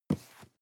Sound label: cardboard box medium grab slide hard; objekte